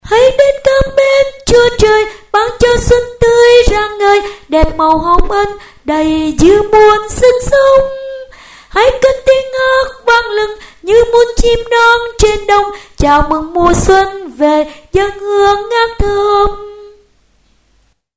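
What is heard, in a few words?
tenor soprano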